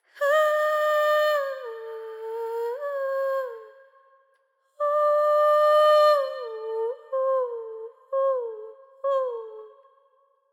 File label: Vox; raji an ancient epic; Mystic Child